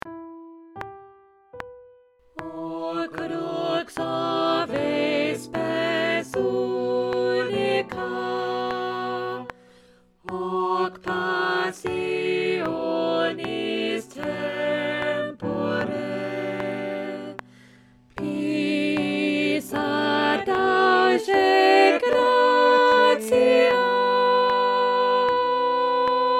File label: alto